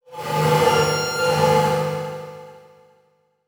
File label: Transition Sweep Medium; Production Element